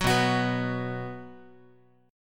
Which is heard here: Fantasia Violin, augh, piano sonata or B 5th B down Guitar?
B 5th B down Guitar